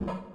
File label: gesture begin